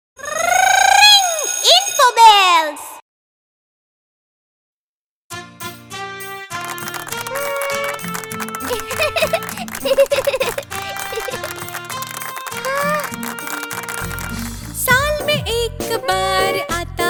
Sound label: Happy Birthday Song in Hindi kids